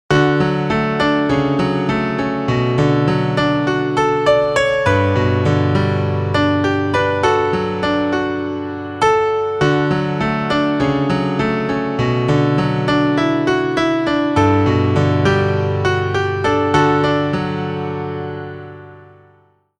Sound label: Pop piano; Sony Ericsson; W910i